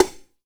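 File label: FX CONGA HSL; AKAI S-Series CD-ROM Sound Library VOL-5; FX PERC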